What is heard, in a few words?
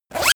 zipper midsize run fast; objekte; reissverschluss